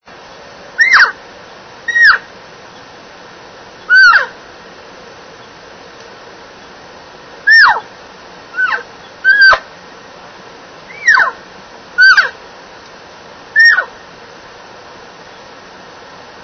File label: cow, elk; elk contest; elk 2001